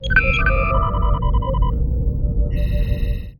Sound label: ambient bridge, computer